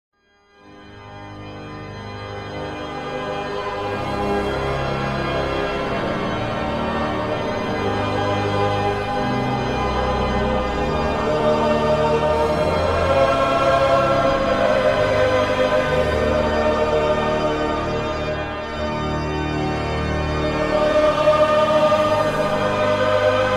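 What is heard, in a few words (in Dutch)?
Psalm, orgel; Nijmegen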